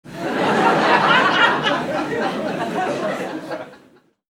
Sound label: Sitcom audience canned laughter; crowd